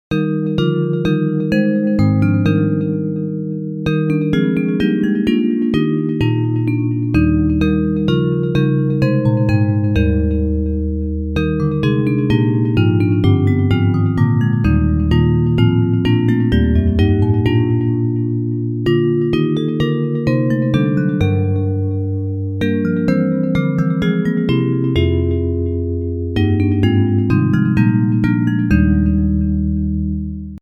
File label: Bells, Hymns